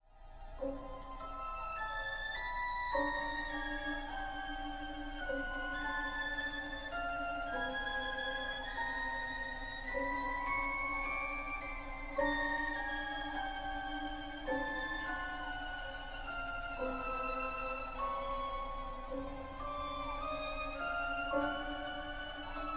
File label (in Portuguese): cordas percussao celesta; bartok